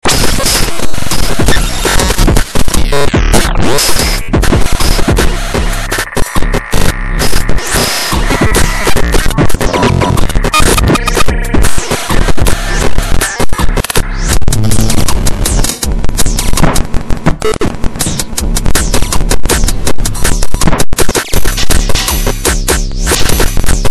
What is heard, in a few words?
damaged nes cartridge, noise